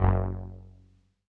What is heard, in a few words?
505, String Machines